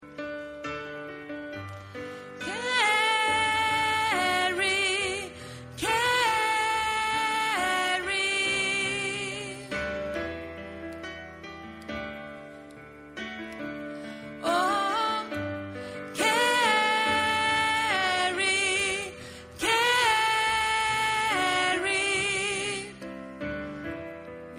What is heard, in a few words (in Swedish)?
sopran